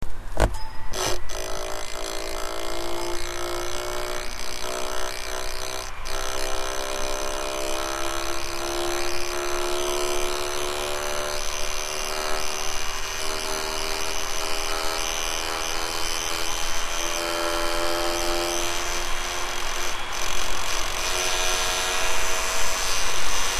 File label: rubbing, fan; vaio